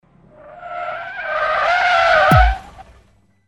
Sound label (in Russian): s tormozom mashiny